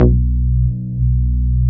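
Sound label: wave bass B1, analog bass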